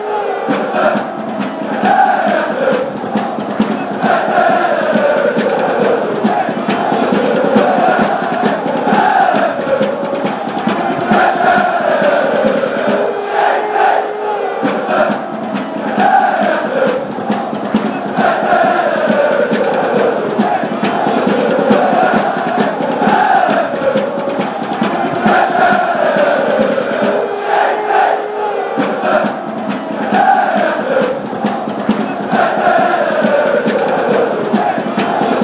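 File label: compilation of lincoln city chants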